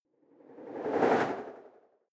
whoosh simple flutter phase

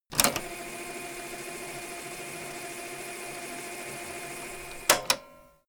tape player rewind

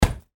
boxing single punch

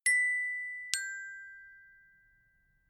notification glockenspiel third down

